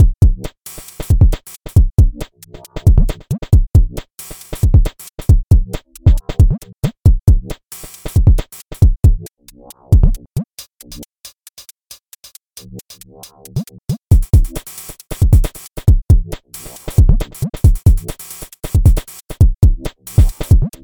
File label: drumloop